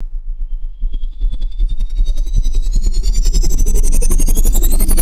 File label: synthesized fan blade spinning up; machines